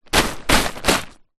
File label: tent ground sheet 3x brushes with hand; foley